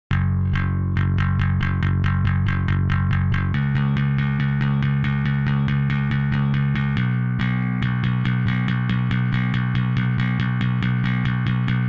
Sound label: bass on